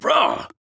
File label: Taking Damage; Koga; Paladins